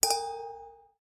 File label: Tactics Alarmed; This Is the Police 2